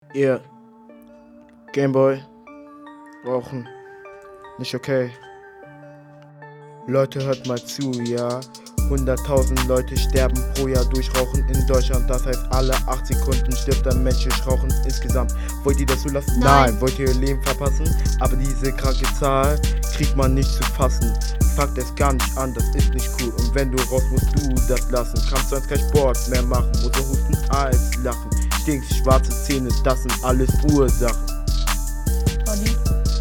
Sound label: Rap; Suchtberatung